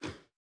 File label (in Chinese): 犰狳环境声